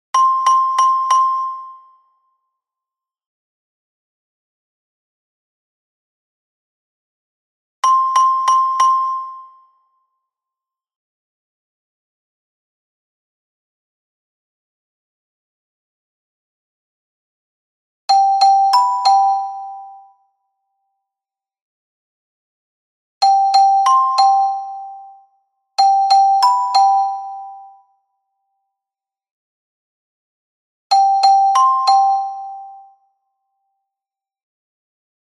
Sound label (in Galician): POMBINA DA PAZ Carillon Contralto; UNHA PEZA PARA CADA FESTA